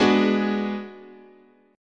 Ab Minor 7th Flat 5th, guitar chord

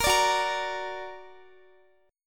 F sharp Augmented F sharp Guitar; x,x,20,19,18,17; strummed

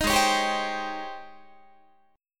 Ab Major 11th Ab Guitar; AbM11 strummed; 18,16,16,17,16,18

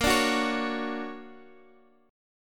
D Augmented Major 7th Bb, guitar

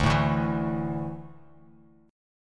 D Suspended 2nd D down Bass Standard; strummed

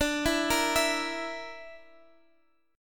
A sharp Major Flat 5th

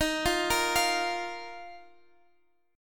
Eb Suspended 2nd, Guitar Standard; Ebsus2 arpeggiated; JGuitar

